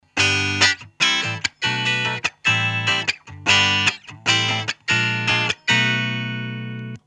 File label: eterna rythm + bass cut